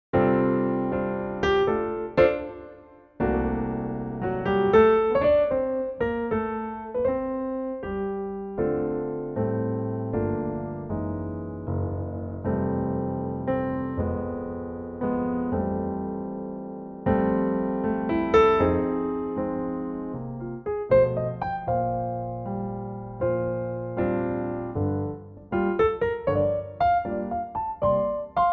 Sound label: F Piano